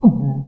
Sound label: monk hit; Undercroft; enemy